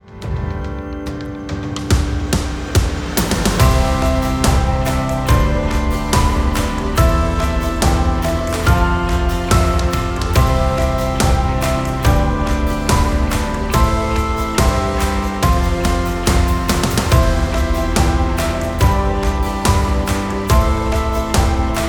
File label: Kids; music for videogames